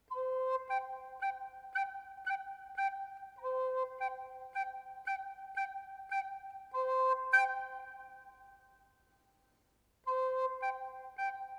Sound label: flute